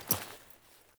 Foley Action Default Melee Catch, Foley Default Clothing; Characters; Player